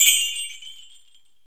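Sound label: sleigh bells; Roland LA; ETHNIC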